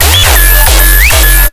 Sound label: bassloop broken sustains c sharp 137bpm; broken sustains three; base pack